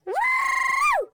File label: Woman Woohoo; voice; Zomboid; Lifestyle